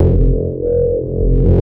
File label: whitehole loop; Grenades; Supermatter